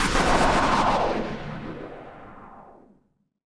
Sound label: NOISE, Production Effects